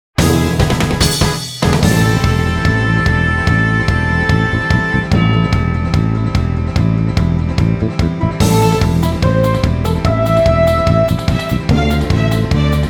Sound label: raging sand smoke